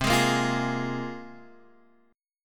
C 7th Suspended 4th Sharp 5th, C, down, Guitar, Standard; strummed; C7sus4#5